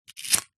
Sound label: household cigarette lighter light; zapsplat